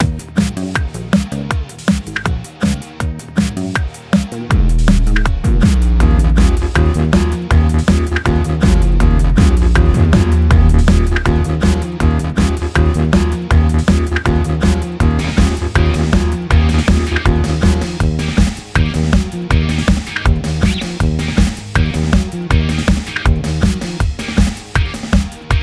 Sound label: hip hop rock